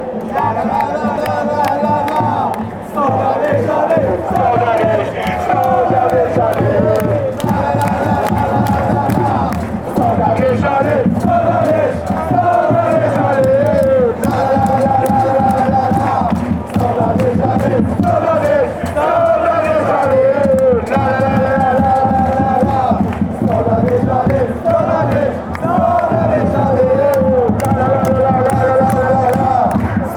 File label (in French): standard liege allez fanchants